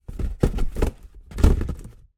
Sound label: foley cardboard box movement shelf; zapsplat